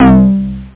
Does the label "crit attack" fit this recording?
No